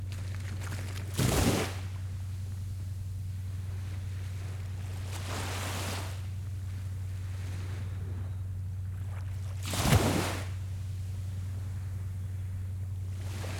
gras frappe prox moteur au loin; fabregas

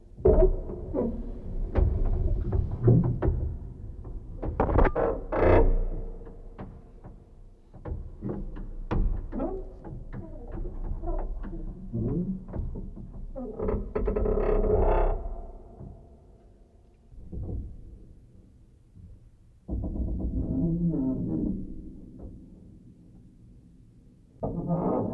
branl clavecin augmente

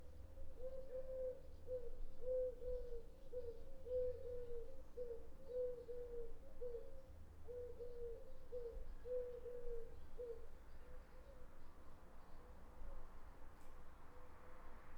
pas ds grenier fenetre ouvert chambre cour

